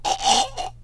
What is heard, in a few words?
choke, survivor voice; Left 4 Dead 2